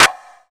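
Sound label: PER PROCU, Procussion, percussion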